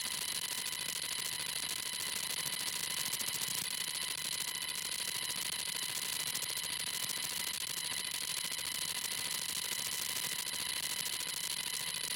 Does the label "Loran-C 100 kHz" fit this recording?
Yes